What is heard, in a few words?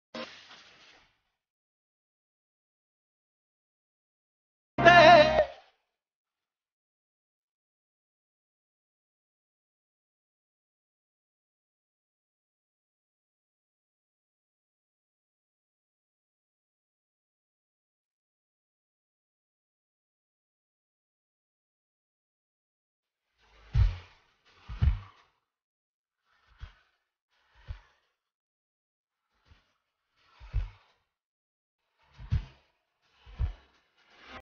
punjabi song